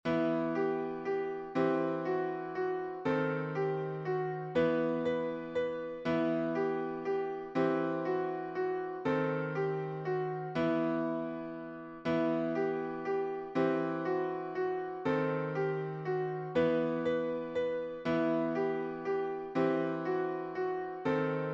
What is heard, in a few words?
Melody, piano